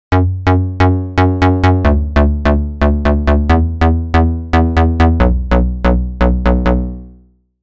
Crush; Curve 2

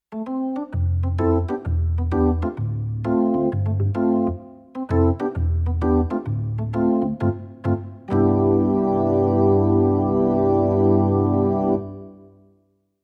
Thomann SP-320 Organ

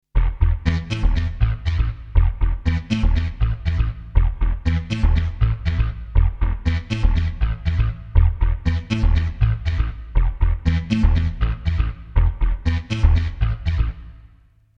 Truck Bass

